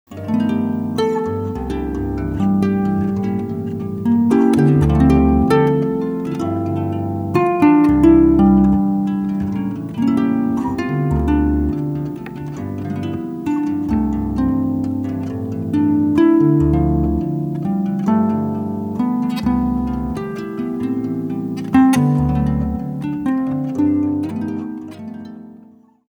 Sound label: waltz, Jazz Harp Christmas